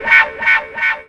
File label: Funk Wah; Jungle; Riffs, Arps & Hits; Blu Mar Ten; Jungle - 1989 to 1999